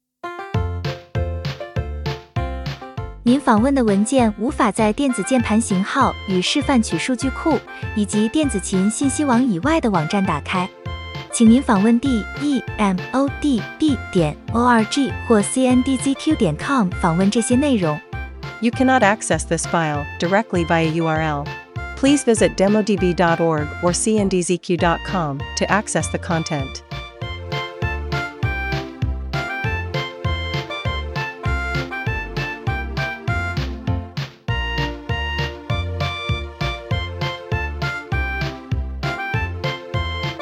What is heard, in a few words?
the danube waves, YAMAHA psr-290